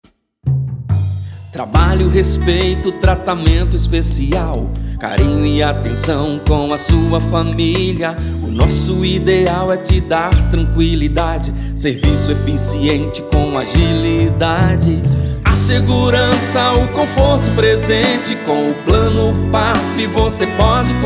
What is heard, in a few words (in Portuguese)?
Funeraria Jingle